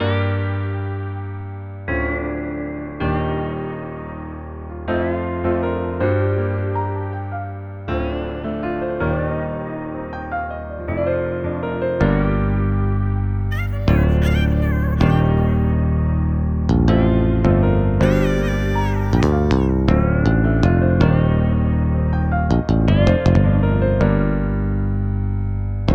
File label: melodic loop compito G